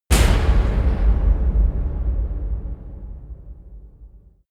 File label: metal impact